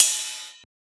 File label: Ride; FLPRO